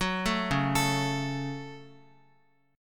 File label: Db Suspended 4th Sharp 5th down Guitar; arpeggiated; JGuitar